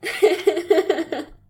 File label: giggles and laughter; SyrinScape